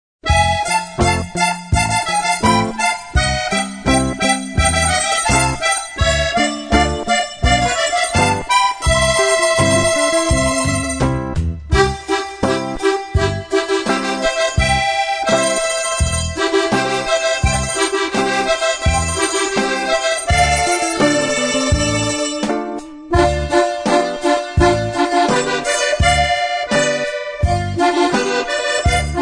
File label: Sirtaki